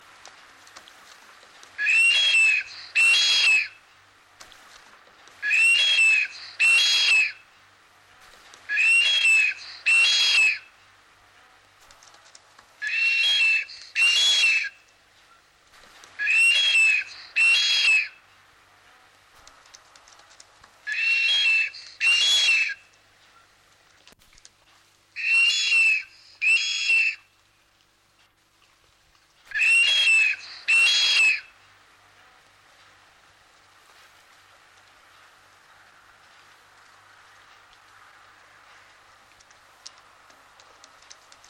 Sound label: whio; Whio Call; Whirinaki Rainforest